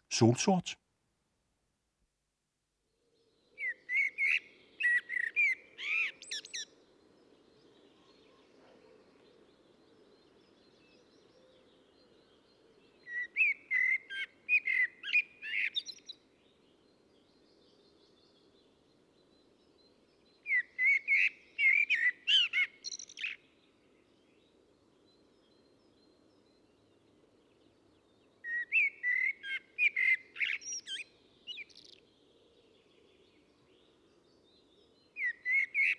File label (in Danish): Solsort; assistens